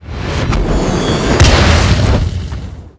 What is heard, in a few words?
Heavy Tank Cannon; blue archive